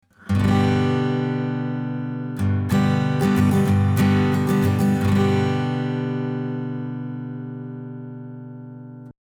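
Tascam Model 24 A Git Schoeps Mk4 Gain 3 Uhr Comp 12 Uhr, acoustic guitar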